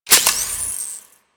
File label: Pistol Reload; Warframe; Operators; Drifter